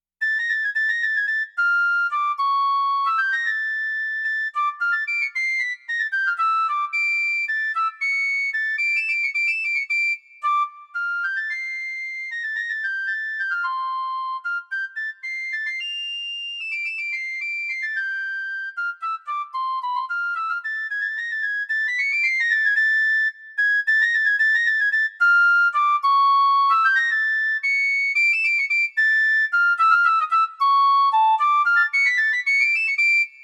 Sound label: Piccolo Technical